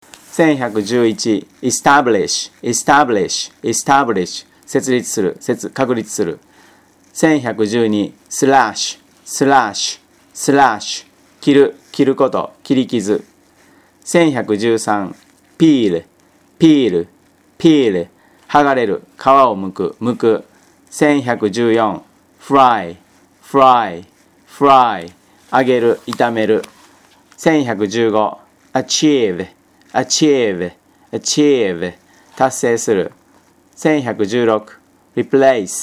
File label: tango